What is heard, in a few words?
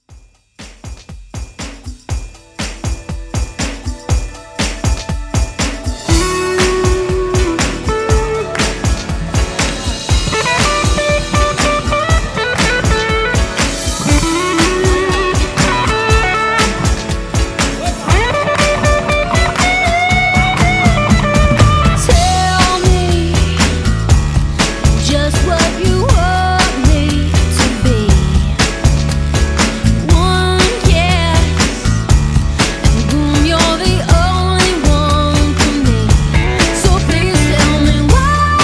2k Pop Re-Drum 120